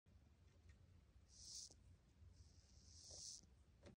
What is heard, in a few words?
Tarantulas